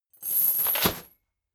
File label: boxing punching bag metal chain fall down; boxen